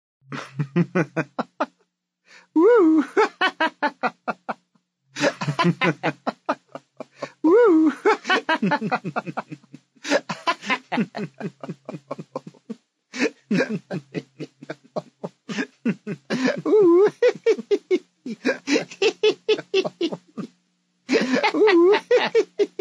dvoe muzhchin poteshautsa nad kem to; Звук смеха

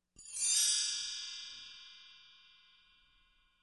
Perezvon vered; Перезвон колокольчиков; Перезвон колокольчиков создаёт волшебные звуки

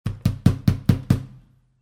ladonju

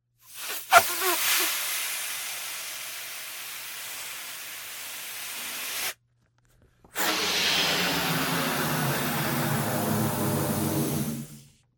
zvuki naduvanija i sduvanija

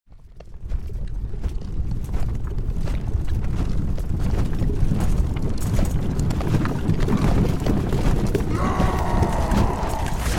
shum bitvy na loshadjah i na mechah s golosami nachalo

